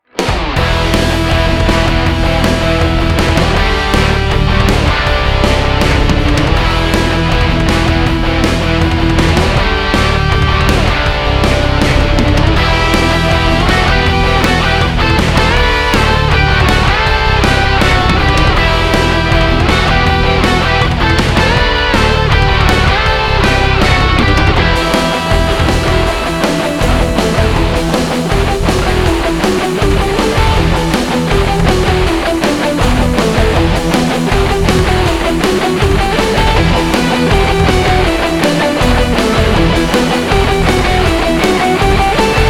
Rocking Action; This powerful, melodic, driving, hard rock is perfect for TV-shows and commercials.

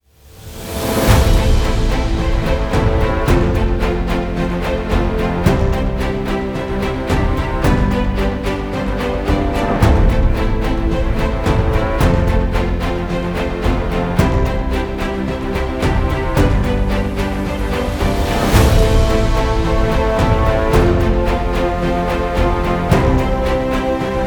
Inspiring Epic

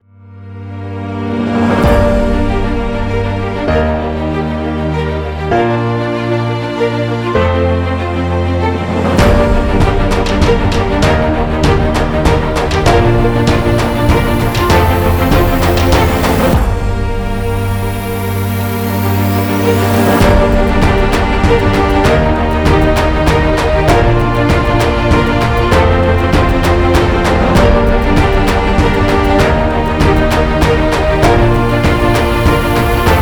Emotional Epic Cinematic Adventure; This modern inspirational epic cinematic music is great for epic commercials, trailers and teasers.

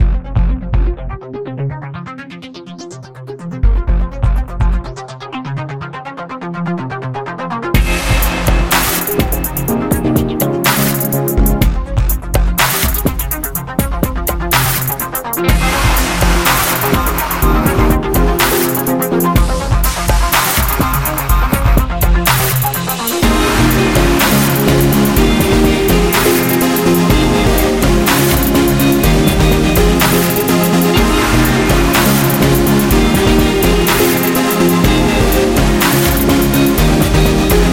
Roof Dancer EDM; An upbeat, inspiring dance track with clean guitars is perfect for games, youth or travel contents.

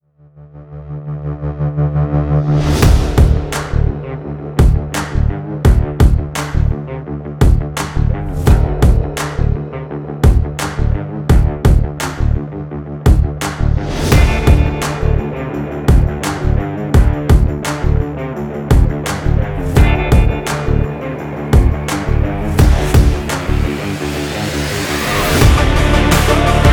Stylish Swaggy Rock version

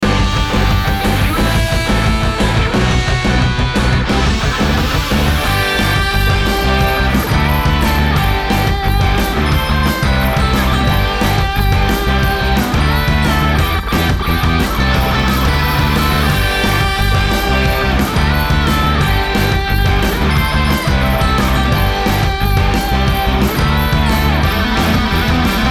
Christmas Rock; Happy Christmas Rock

